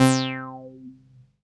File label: 505, String Machines